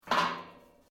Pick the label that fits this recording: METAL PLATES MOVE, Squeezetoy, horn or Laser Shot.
METAL PLATES MOVE